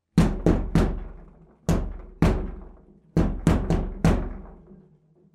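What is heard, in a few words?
fist sound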